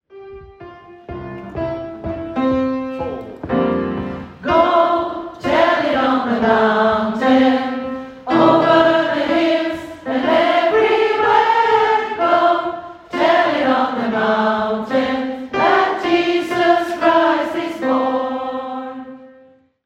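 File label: Go tell it on the mountain, Alto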